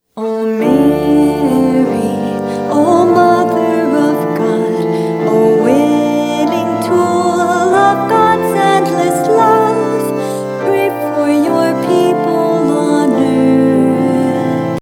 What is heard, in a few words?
hymn